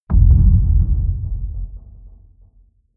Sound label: boom low impact; hits explosionen